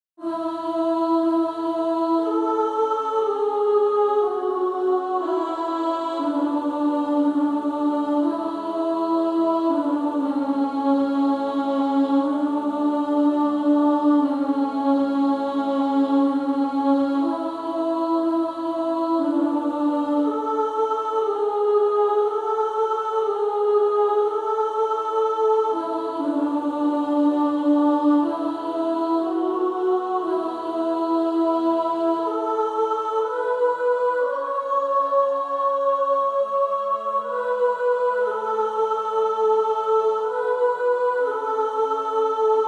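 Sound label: Female Choir, alto 1; Were You There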